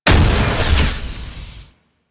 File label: Flak Cannon Alt Fire, weapons; Warframe; UT Flak Cannon